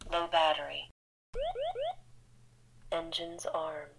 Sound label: Transmitter Sounds